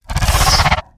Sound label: indoraptor